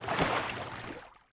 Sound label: swim